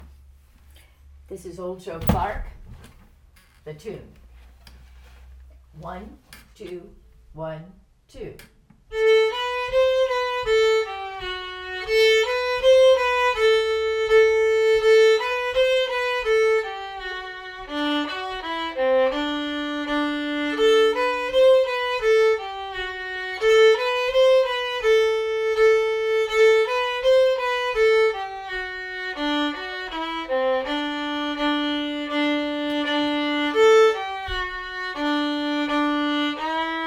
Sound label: Old Joe Clark Tune